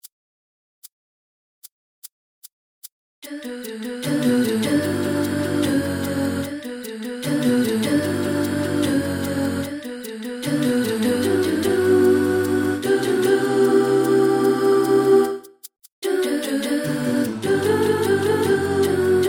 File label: Sopr